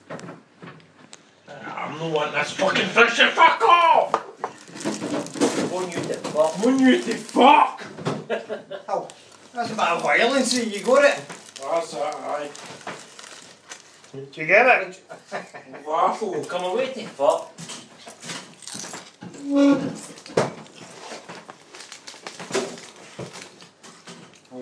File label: sigh drunk